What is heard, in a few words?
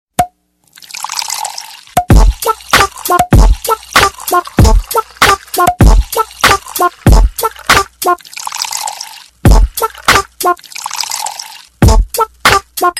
funny drink